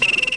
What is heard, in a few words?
aliens